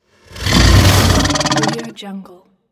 halloween monster growl

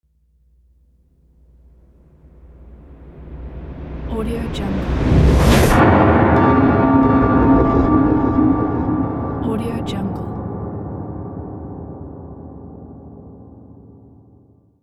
horror impact

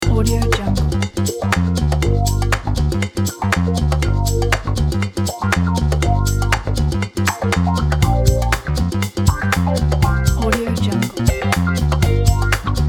advertising funk

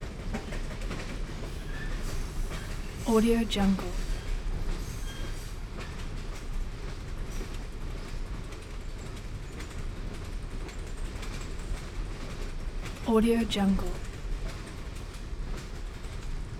train ride; collanderson

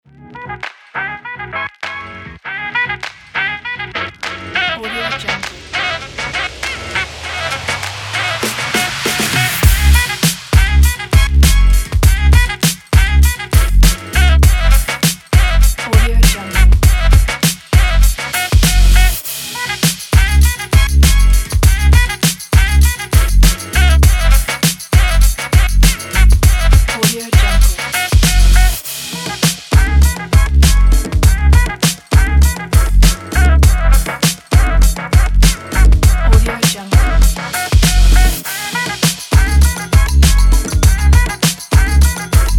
funk hiphop beat kit

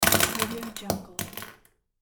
pens drop

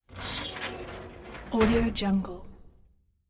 doors metal sliding fireplace

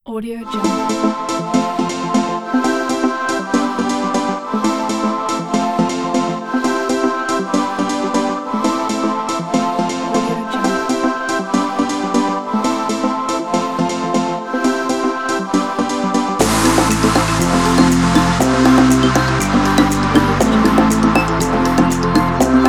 tropical house

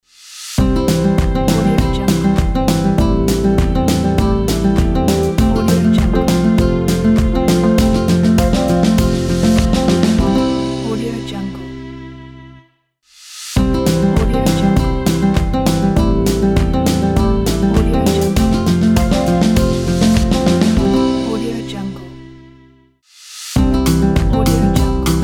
country logo

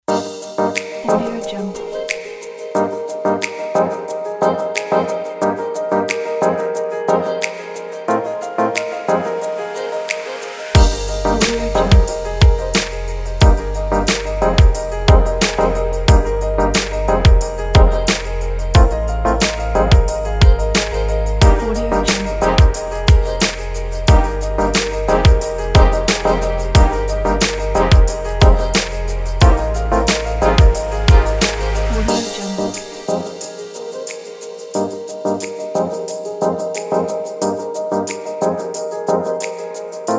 summer hip hop

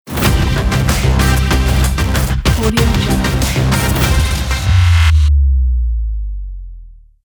action trailer logo

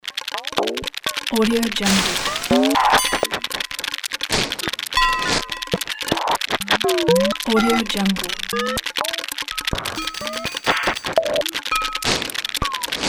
steampunk contraption

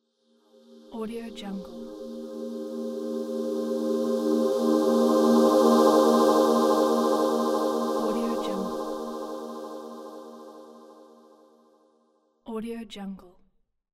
atmospheric logo

